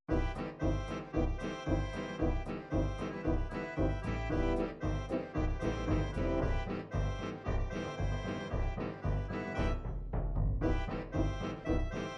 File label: polka, midi